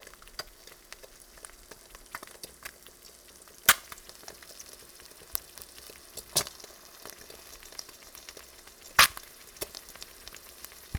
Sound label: eggs in a pan